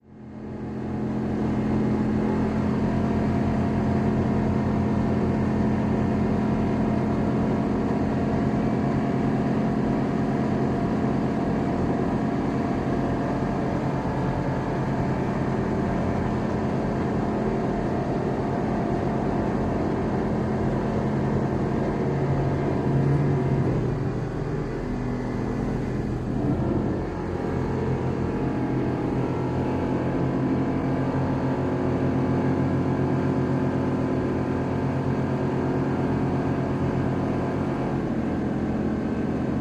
Military Pbr: Onboard Firefight Meneuvering. Some Water Maneuvering. Dual